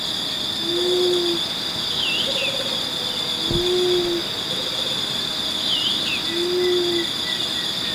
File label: Geotrygon montana